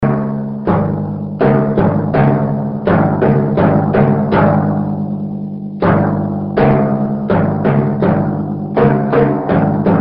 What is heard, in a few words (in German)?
kesselpauke